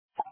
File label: Windows XP Balloon